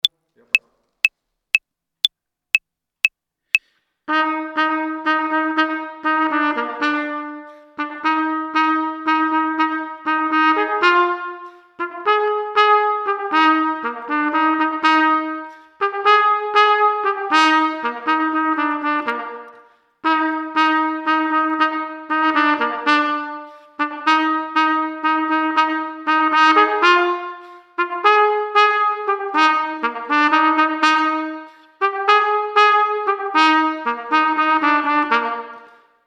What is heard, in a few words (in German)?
Mini ROCK 2. Stimme solo